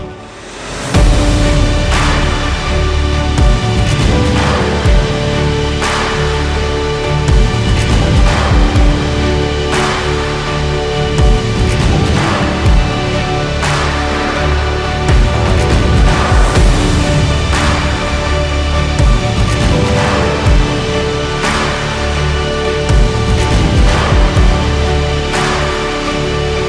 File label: rest (instrumental)